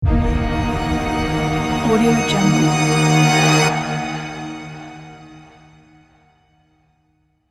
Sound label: cinematic uprising strings for tension